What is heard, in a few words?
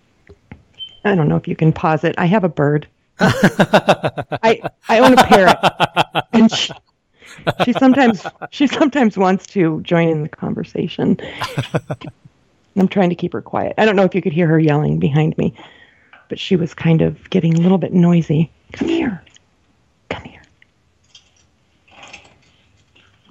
Parrot Attacks